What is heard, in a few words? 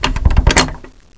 latch locked